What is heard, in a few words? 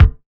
puffy kick one shot F key